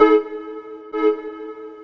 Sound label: Synth, Techno